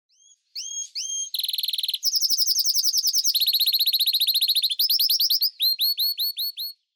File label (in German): Kanarienvogel Serinus canaria; Vogelstimmen